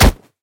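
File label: crack of a wooden object after a hit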